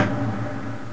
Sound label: rimshot; Sounds Terrific II